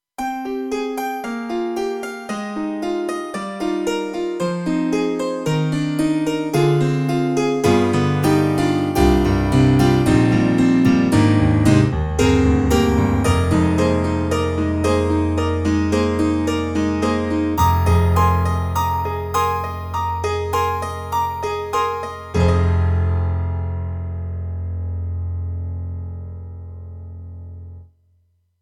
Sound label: pop piano, TRITON Rack; KORG EXB-PCM08